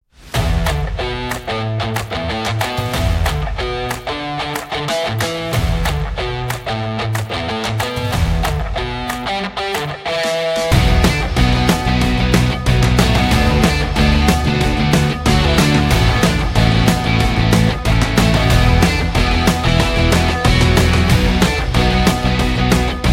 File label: Energy Rock; MIUI12